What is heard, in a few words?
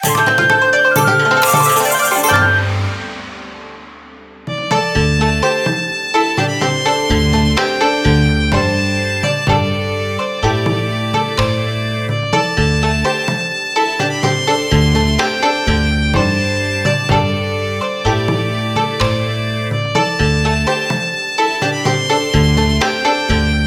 BGM China Round Hand End; Clubhouse Games: 51 Worldwide Classics; Salon